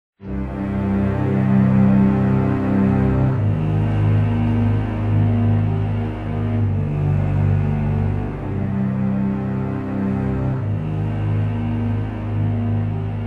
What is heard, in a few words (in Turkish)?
muzik